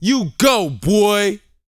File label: VOCAL F; ILIO